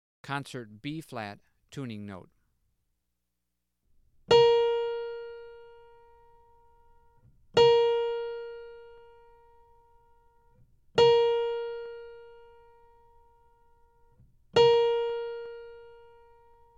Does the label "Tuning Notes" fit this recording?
Yes